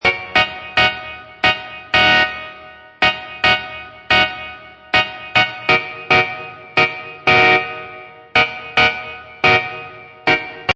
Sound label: Jazz electric guitar loop in D# minor at 180 bpm; Guitar Loops